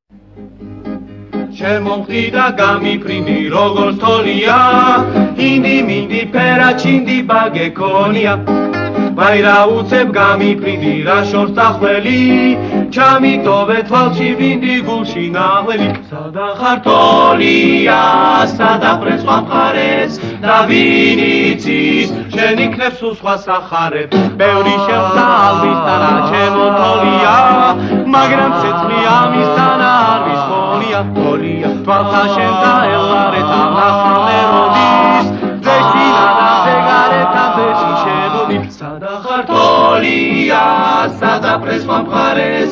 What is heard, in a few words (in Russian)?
pesnya iz k f Kukly smeyutsya arhivnaya zapis